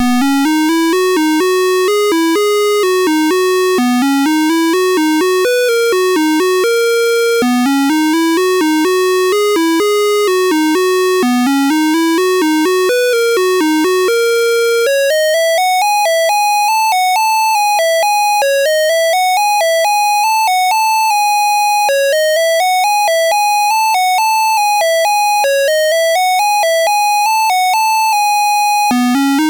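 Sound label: Quest of Kings BASIC, MOS 6581 SID chip music, games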